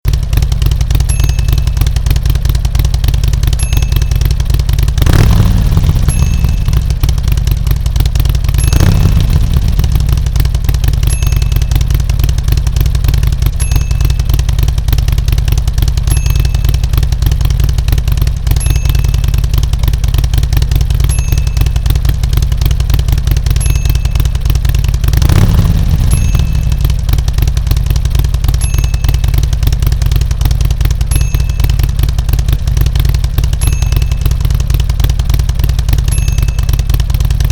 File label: Motorcycle Idling and Revving